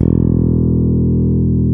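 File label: rock fretless E3; Drums In Your FACE!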